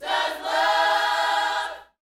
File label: Vocal, Gospel, PHRASE; Spectrasonics